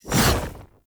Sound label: Blade And Whip Pull Back; Warframe; Melee; Dagath Blade And Whip